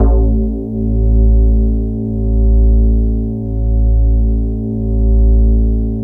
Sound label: E HARP R, DX HARP